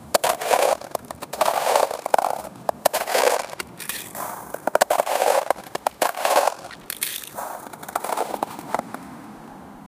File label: calm and clear ice skatin, ice-skating